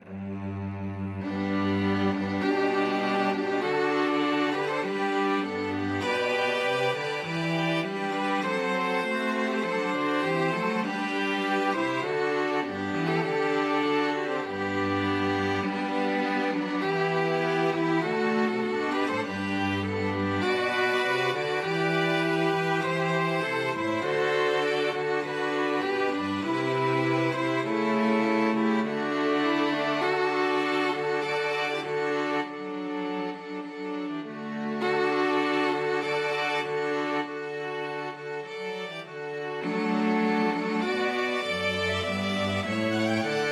fireworks overture, flexible mixed ensemble 4 players